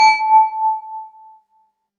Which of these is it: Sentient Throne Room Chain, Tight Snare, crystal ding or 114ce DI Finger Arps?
crystal ding